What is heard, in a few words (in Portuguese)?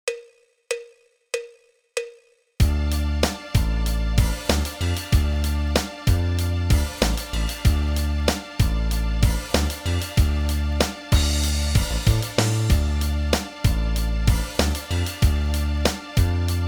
Re menor, guitarra, Triades Menores